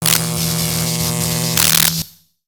Robot Electro Arcs; Lego; ambience nexus tower

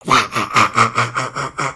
Laugh; Skullkin; creatures organic

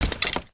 idle rattle; creatures organic

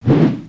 WPN WHoosh; creatures organic

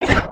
Run, creatures organic

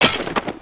Skullken Walk Run; creatures organic

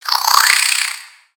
Spider Angry

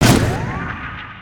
Spider Boss Fire Rain Shot; creatures mechanical

